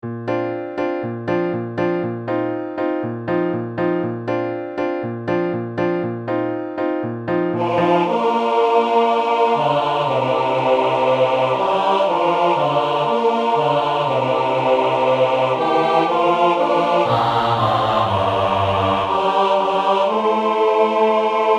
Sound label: Bass; West Side Story